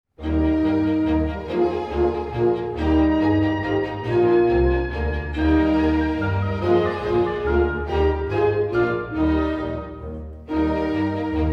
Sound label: Menuets - in E flat; Mozart; Serenades-Divertimenti-Dances